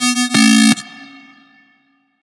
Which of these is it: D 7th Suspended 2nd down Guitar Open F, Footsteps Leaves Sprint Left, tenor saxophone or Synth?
Synth